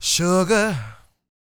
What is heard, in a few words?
SING, Vocal Planet Blues Soul BLUESY